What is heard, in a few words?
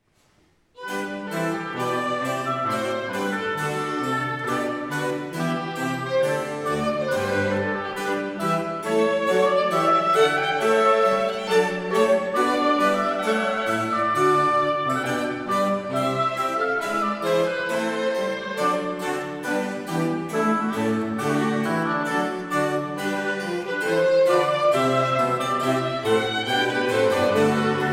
Gavotte; Sehet in Zufriedenheit